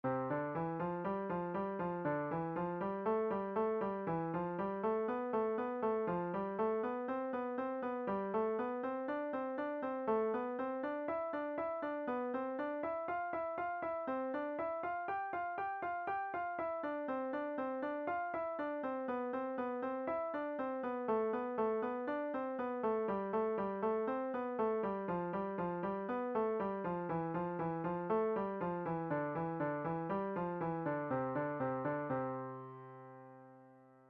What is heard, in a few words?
Five finger Intervals Second, piano